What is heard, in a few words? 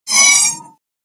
agressive motion